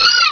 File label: cry; source of the sovereign